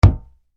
Impact Wood Chest Hit Hollow; Miscellaneous Impact; SFX Bible

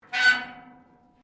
METAL RUSTY SCRAPE

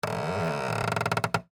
Creak Plastic, Texture, Creak, Plastic; Creaking; Objects; BlastWave

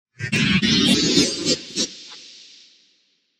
Scifi Power Up Fault; Tech Sound Effects

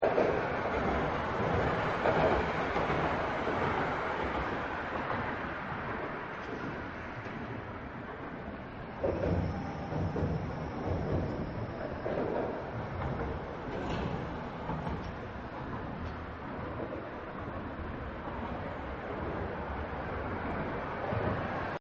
Traffic Over Bridge Loop; Traffic And Cars; Partners In Rhyme

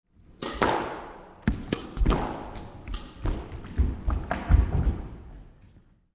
Sports Squash Rally; Lynne Music

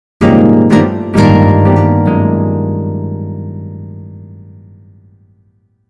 Logo Guitar Plucking Strum; Sonic Logo; Production Element Imaging Element Accent Transition

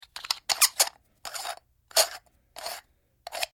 Canister Foley Squeaky Open Close; Squeaks (Sound Effects:Objects)

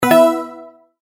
multimedia tone correct; Sound Design; McKinney Sound

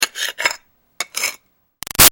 Foley Rock Scraping Trowel Archeaology; Materials

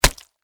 Flesh Chop Impact; Horror; Blood and Guts (Sound Effects:Horror)

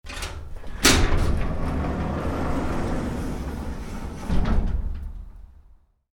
Garage Door: Open Manually; Doors; Garage Door Sound Effects; Garage Doors (Sound Effects:Doors); Stuart Duffield

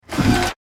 Gear Device Switch; Science, Fiction; Robots

